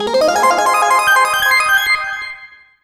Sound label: Next Lap Fanfare; Mario Kart 8